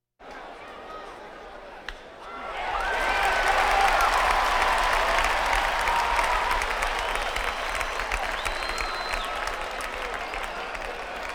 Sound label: Crowds Race cars Baseball